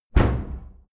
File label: cupboard close hard